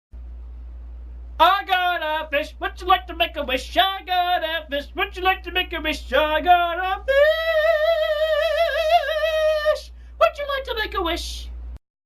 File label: chant